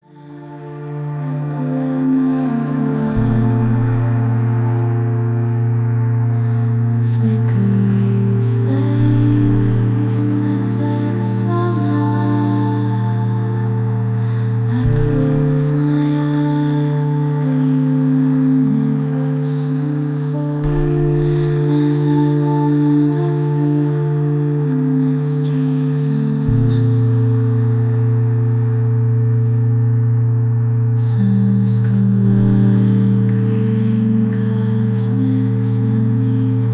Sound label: Celestial Relax